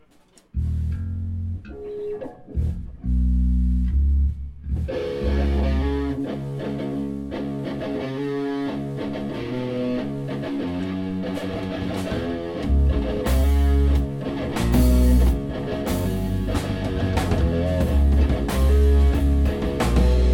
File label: riff slicer; dial-o-matic